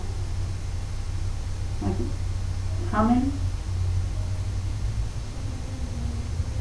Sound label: girl singing